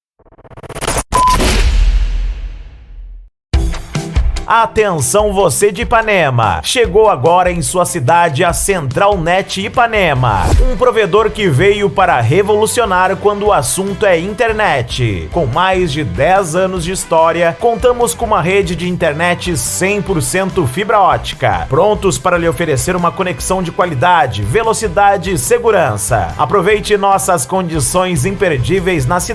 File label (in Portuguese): vinheta